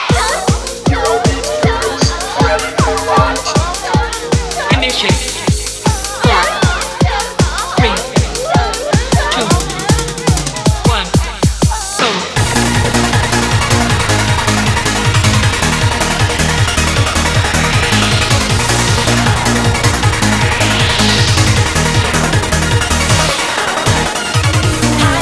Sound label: Eurobeat